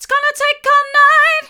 its gonna take all night hard, vocal

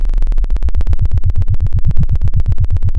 pop, Sound of a helicopter

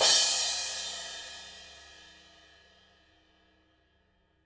Trash

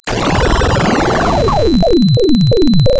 pop

